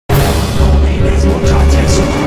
Fight Lose; music; Silas; The Da Vinci Code; XBOX

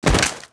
deadfall stone, foley

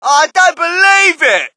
crowd, shout; Mike Tyson Heavyweight Boxing

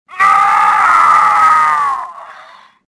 choke; stofficer

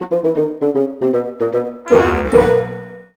Loss

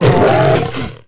tukata; Star Wars Knights Of The Old Republic I; XBOX

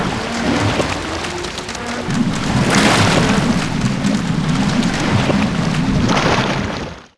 ship destruct; Sid Meiers Pirates; XBOX

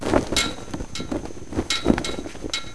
chain; WhiteOut

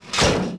level animate top; Tony Hawk's Project 8; XBOX

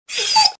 die; Soldier Of Fortune II Double Helix; XBOX

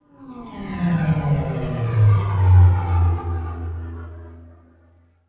flyby distant, vehicles

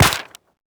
paper hit; projectiles; bullethit; Max Payne

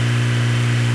4WD Move loop

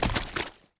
bodyfall mud

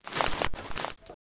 trip loop, tripwire; Soldier Of Fortune II Double Helix; XBOX

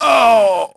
death; SOLOMON; Pulse Racer

